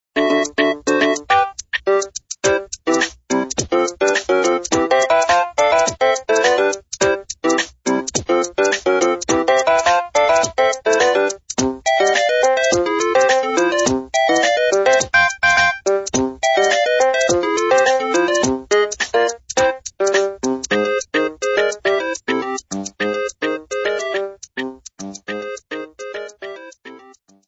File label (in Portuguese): toques engracados super mario ringtone